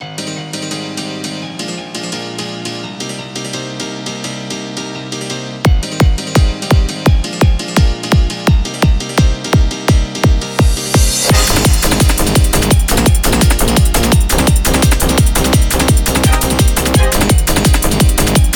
techcore; 1 chorus; weeklybeats 2024

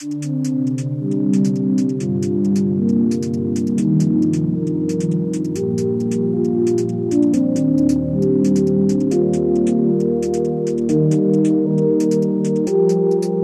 90s idm jungle rave sketch

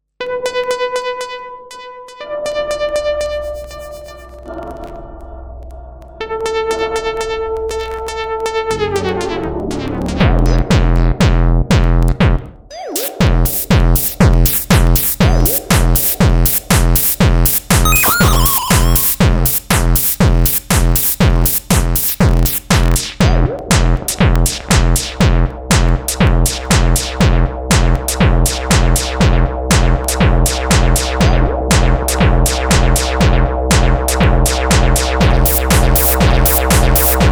gabber hate noise lsdj renoise; weeklybeats 2014

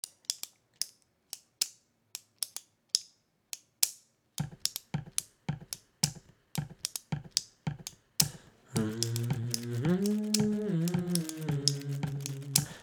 desk hymn; weeklybeats 2026

